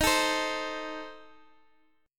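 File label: C Minor 6th, E flat, guitar chord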